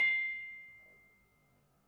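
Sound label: Panerus C6, gravity harps tuned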